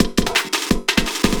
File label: LOOP, 170 BPM